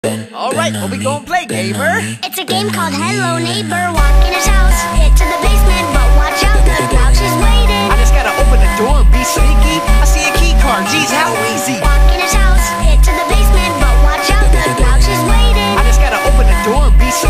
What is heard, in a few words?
funk